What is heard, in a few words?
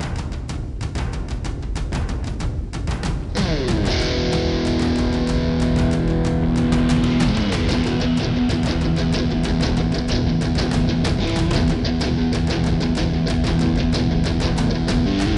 no cello